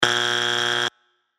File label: buzzer wrong